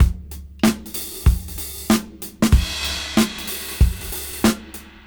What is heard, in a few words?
Funk 94 BPM; AMG - Keith LeBlanc Phat Butt Jams